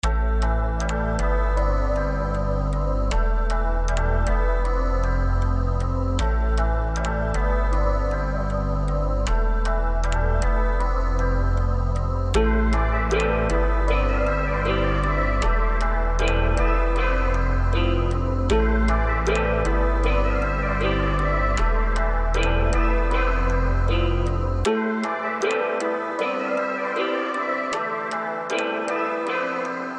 Am melodic emotional 156

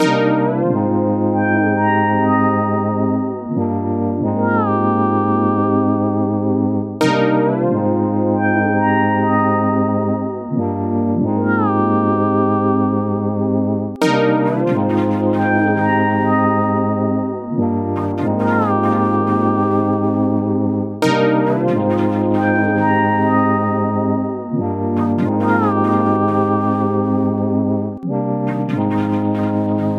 137 Cm

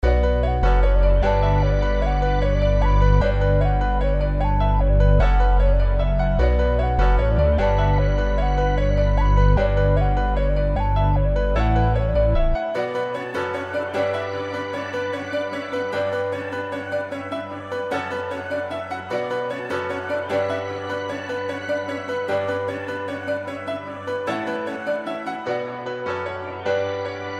151 Bm melodic upbeat

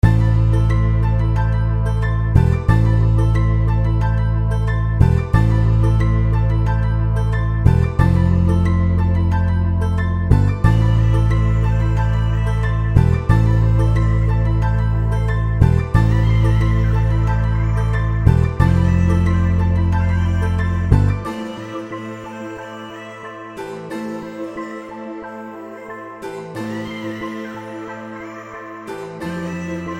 Am 181 melodic emotional

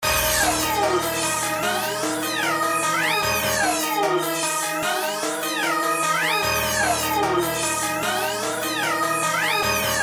D m turnt atlanta drill 150

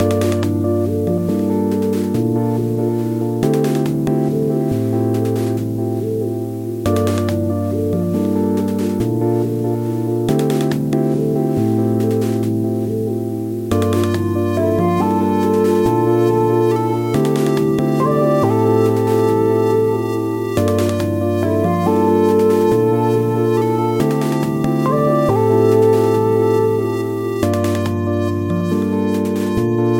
vibration 140 Dm

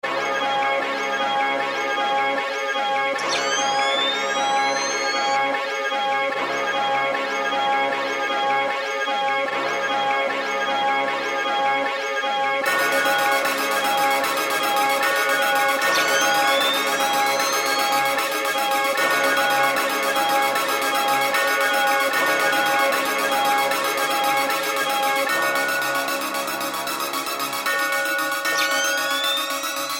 C m dark atl drill 152

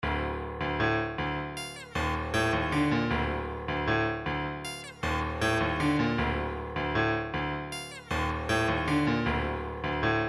Dm 156 turnt upbeat

